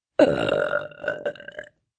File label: Burp Long, Burp, 1 child, long, deep, belch, human voice, kid; Burping; VoiceBox